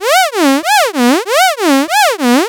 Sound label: LFO, Noise